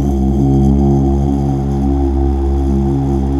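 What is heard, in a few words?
Male Uuh; Best Service Gigapack 1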